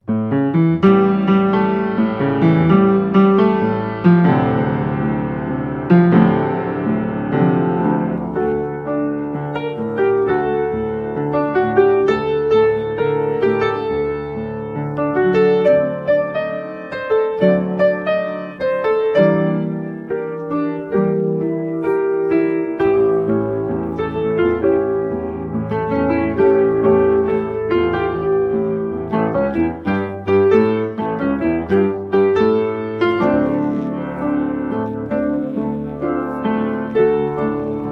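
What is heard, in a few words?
speech therapy vocal warm-up backing track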